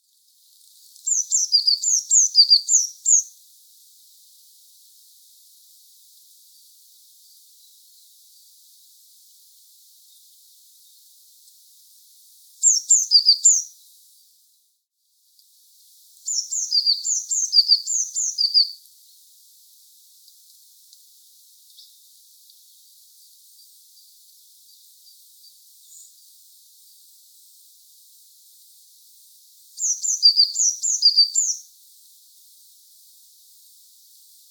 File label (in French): mesange bleue chant